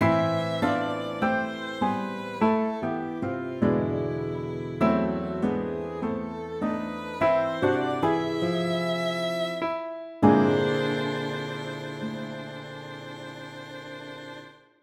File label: intro gentle rain